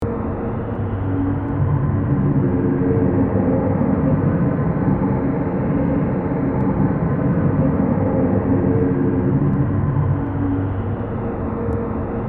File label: Moaning Room; Saw II Flesh & Blood